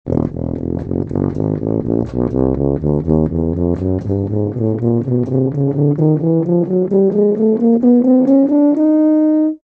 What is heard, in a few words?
Tuba